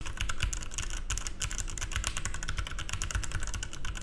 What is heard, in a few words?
Red Switch